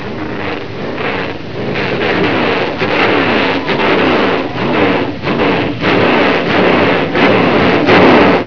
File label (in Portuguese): CARRO